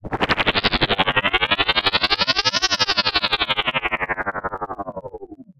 Science Fiction Sci-Fi Electronic Fluttering Noise